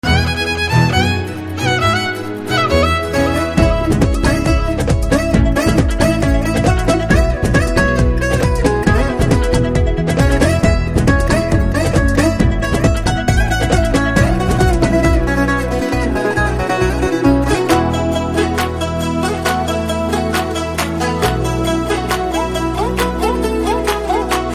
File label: BG Instrumental Tone